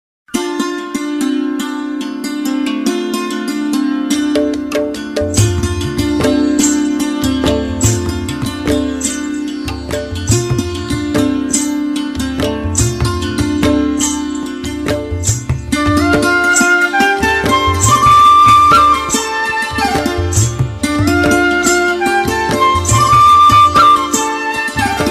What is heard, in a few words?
top ringtone santoor classical ringtone